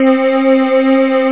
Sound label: Candle Choir, Strings Choirs